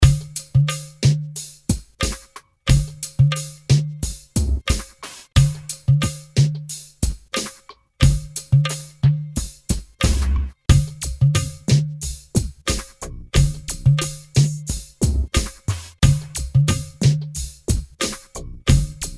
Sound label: Folk Fusion Theme, free dj beats